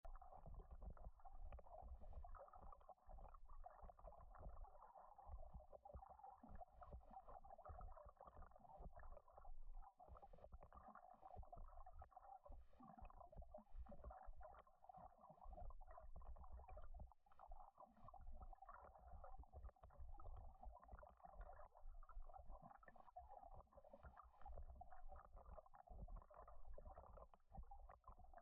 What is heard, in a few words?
water noise; beneath the surface